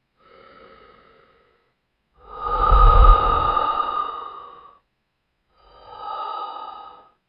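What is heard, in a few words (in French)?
respiration très lente, meditation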